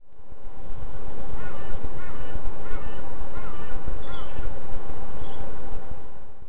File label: crow F sharp D F D later